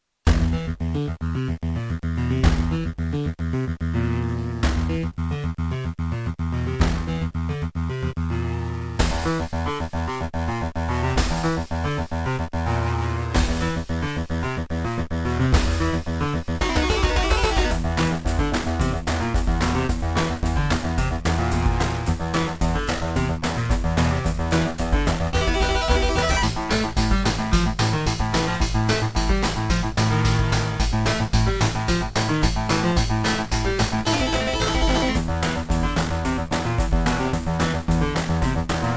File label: Beepblaster 2.0 SYNTHMODE; Doom